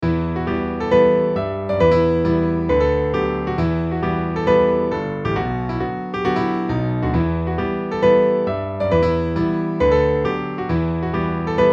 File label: piano